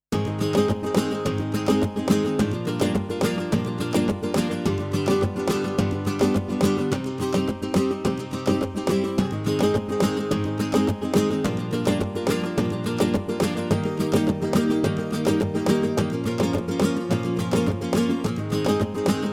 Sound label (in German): Ketron SD9 Gitarre Percussion